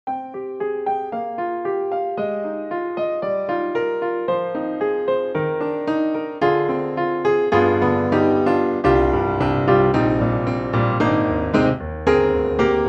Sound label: Berlin Dark Grand; KORG KRONOS 2